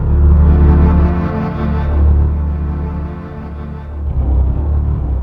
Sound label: synth